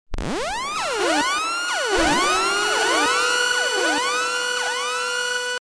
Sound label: dance summer